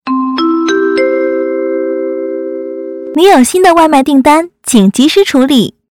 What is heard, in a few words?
waimai new